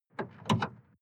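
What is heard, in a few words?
sports car exterior bonnet handling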